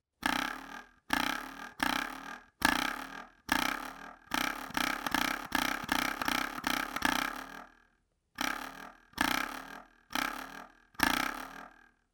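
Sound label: ruler sound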